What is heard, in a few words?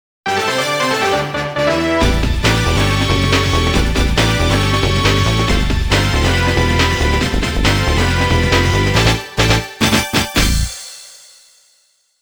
All Clear